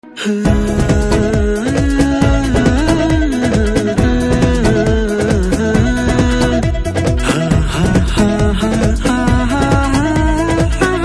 BG Humming Tone

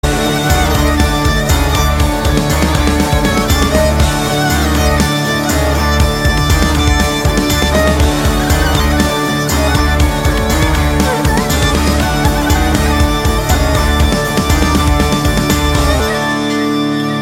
Electric Guitar Tone

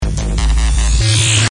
power shock message tone

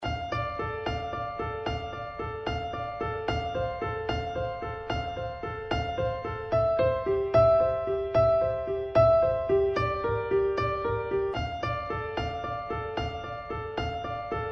Melody Ringtone

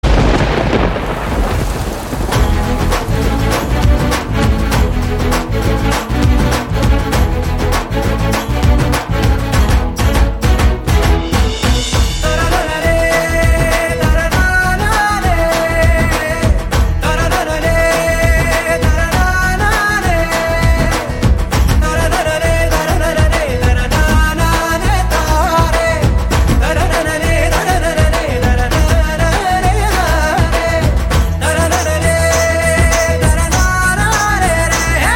Rain Version Bgm

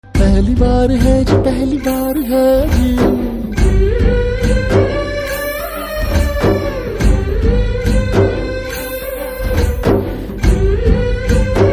Ringtone with BG Humming

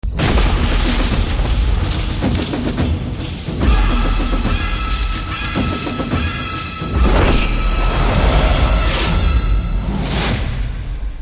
Prelude BGM Ringtone; Allu Arjun; Pushpa

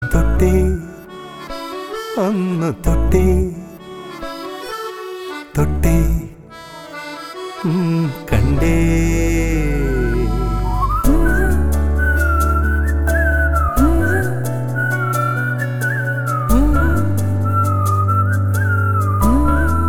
Love Ringtone

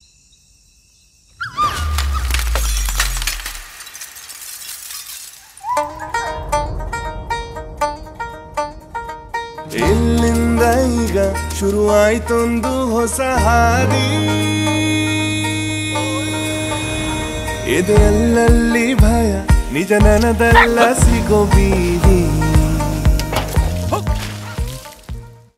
Dog Entry Bgm; 777 Charlie

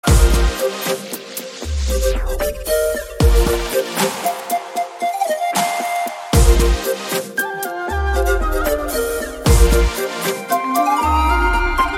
Love BGM

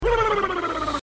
Message Tone

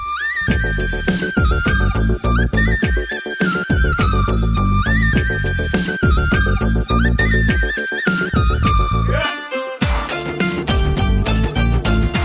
Flute BG Tone

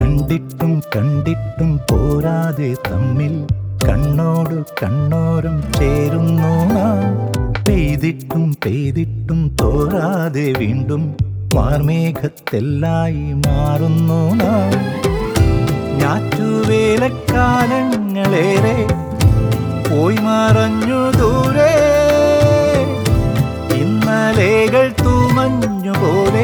Male

